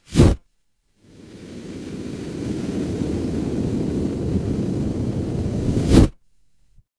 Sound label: fire electric whoosh flame